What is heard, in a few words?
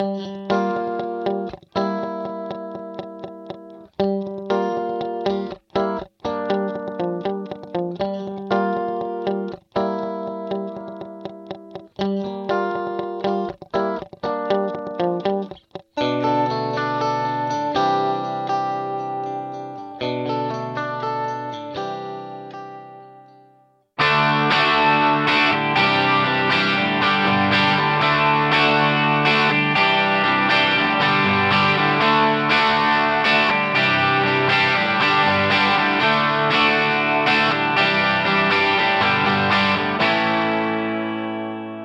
Guitar Yamaha Rev7 Stereo Flange Vintage Digital